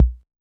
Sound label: OSH Kick; Big Fish Audio; Old Skool House; OSH Kits; OSH Kicks